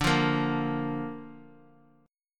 D-Minor Sharp 5th, Dm#5 chord; Dm#5 strummed; x,17,15,15; JGuitar

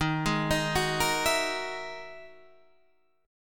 E-Major Sharp 11th Eb

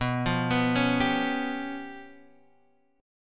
Db 7th Flat 5th

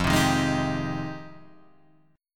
F Minor 9th F

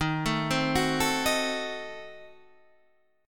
D sharp Diminished 7th D sharp Guitar Standard; arpeggiated; D#dim7; 11,12,10,11,10,11; JGuitar

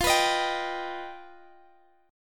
G Augmented 7th

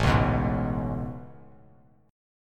A Major 9th A, Bass Standard

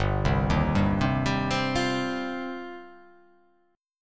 G 7th Suspended 4th Sharp 5th

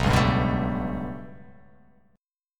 B 7th Suspended 2nd Sharp 5th down, chord

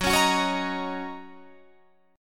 Gb 6th, Guitar Drop D

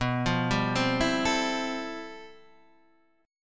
G 13th B, chord

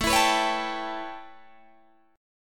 A 9th A, Guitar Open D

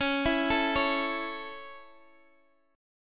Db Minor Sharp 5th, down Guitar; arpeggiated; 4E,4A,5D,5G,5C,6F; Dbm#5

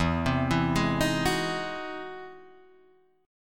E Augmented Major 9th, Guitar, Open D

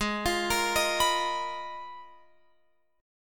Ab Minor 6th Add 9th, Guitar, Open F; arpeggiated; x,15,17,17,18,18; down

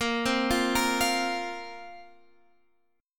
A sharp Suspended 2nd, down, Guitar, Standard; arpeggiated; JGuitar

